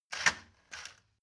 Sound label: motorcycle harley engine ignition